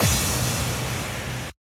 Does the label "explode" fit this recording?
Yes